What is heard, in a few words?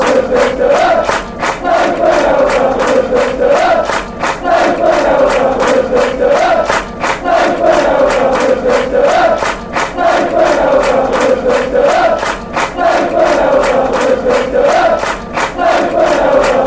duh duh duh duh, chants; nathan elder